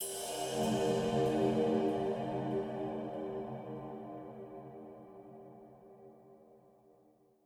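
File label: Reverb Cymbal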